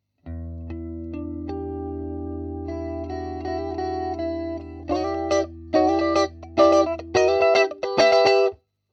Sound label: light flanger